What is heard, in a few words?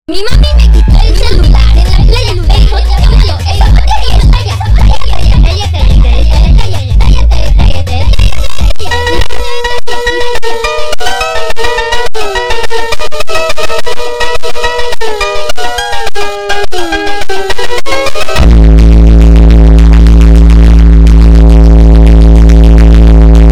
funk superbass reverb slow